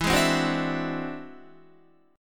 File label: E Augmented 9th E down Guitar; E+9 strummed; JGuitar